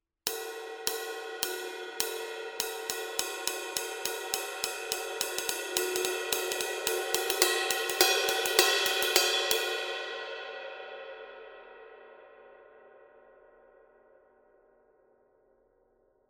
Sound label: 20 dry ride pattern; paiste; masters